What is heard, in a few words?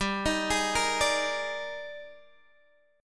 Eb Major 11th G